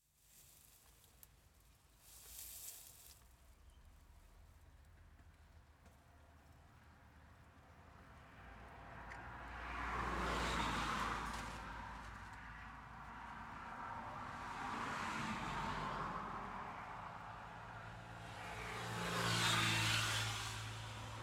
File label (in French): Friche Scierie Châtenois Bord Route Marche Brindilles